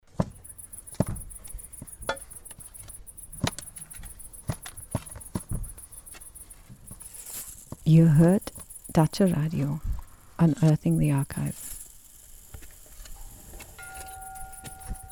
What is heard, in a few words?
jingle; unearthing de kate